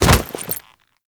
Kuva Lich Finisher Grab; Warframe

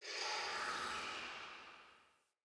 Cavernous Water Lap Rock Distant, Ambience; UnderCity

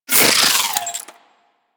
Turret Throw Whoosh; Warframe; Corpus; Corpus Railjack; Admiral Agent

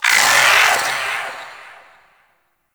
Shadow Vocal Death; Warframe; Duviri

